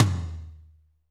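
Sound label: snare, Medium Rock; Northstar; Drumscapes Roland; M R Kit 1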